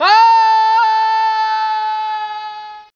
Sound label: FALL